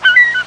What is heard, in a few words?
Gulls sound effect